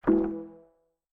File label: Mountain SFX, Mech Sample Machine Activated